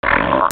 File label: ANIMALS